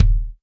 natural bass drum sample d sharp key; kick drum

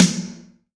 noisy acoustic snare sample f key; Mono; snare-drum-samples

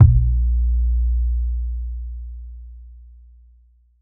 fat tr 808 synth drum kick a sharp key

